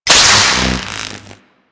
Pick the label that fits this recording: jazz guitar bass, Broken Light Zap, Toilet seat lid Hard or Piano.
Broken Light Zap